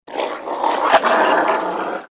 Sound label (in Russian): zlobno rychit